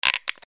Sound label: electrical switch